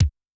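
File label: bass drum, Kick, Yamaha DTXpress-II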